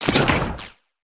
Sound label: defense generate weapon activate gear; metal